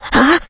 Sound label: death, infected